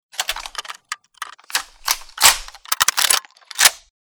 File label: an94 load, weapon